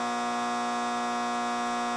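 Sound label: ambient electric box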